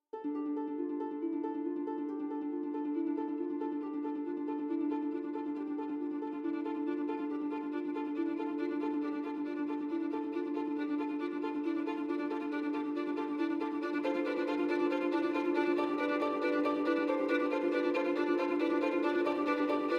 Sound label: Orchestral